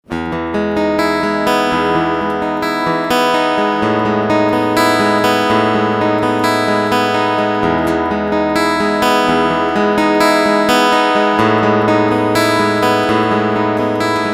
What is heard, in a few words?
Picking